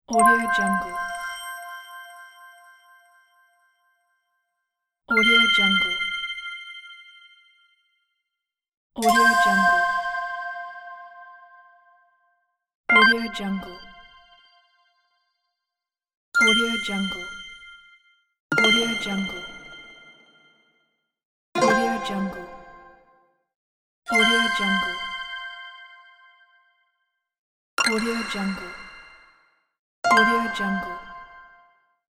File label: the notification